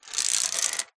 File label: leisure domino chain reaction short wood